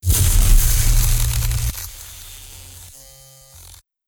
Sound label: Electricity Burst